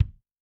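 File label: bass drum dry, Kick, Yamaha DTXpress-II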